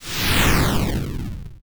explosion; videogamebundle

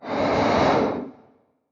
airy whoosh; SoundsCrate

